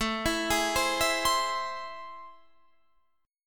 A Minor Major 7th

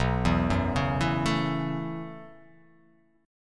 B 7th Suspended 2nd Suspended 4th down, chord

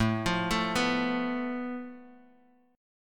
A Suspended 2nd Sharp 5th, Guitar, Open D

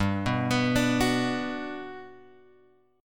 G Major 7th, G; GM7 Chord {3 2 X 4 3 2} arpeggiated

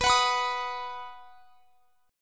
B Major B down; strummed

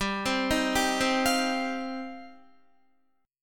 G 7th Suspended 4th Sharp 5th, Guitar; Listen to G7sus4#5 arpeggiated; G7sus4#5 Chord

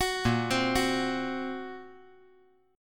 Bb Suspended 2nd Sharp 5th; Bbsus2#5; arpeggiated; 11,10,8,9; JGuitar

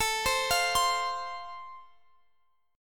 A Minor Sharp 5th A, Guitar, Half Step Down

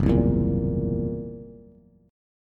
Fsharp 7th Flat 5th down Bass

